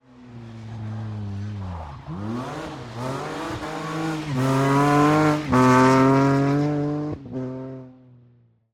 Rally Car Braking Cornering Accelerating; Rally Legend

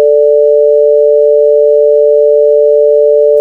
sine adpcm; hl2

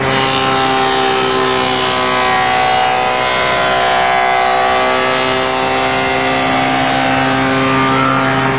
spin loop medium, manhack; hl2

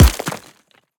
debris impact, Alien Creature; BluezoneCorp; Invader

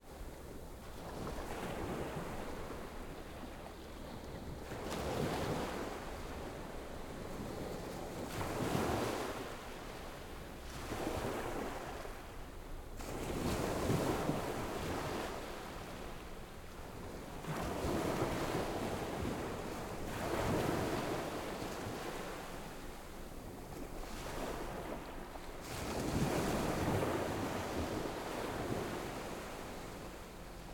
ambience coast Beach Surf Calm Close; Coastal; Systematic-Sound; General Ambience Series

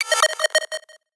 Cyberdeck General UI; CB Sound Design; Hologram & Transmissions Sound Effects

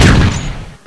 air turret fire, vehicles; hl2

